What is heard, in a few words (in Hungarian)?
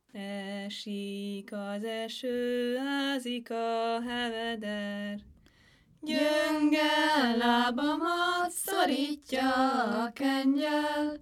nepdal; esik a eső az ika heveder